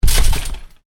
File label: Trampoline bounce heavy, Trampoline, bounce on: heavy bounce on canvas trampoline; Miscellaneous Sport Sound Effects; Stuart Duffield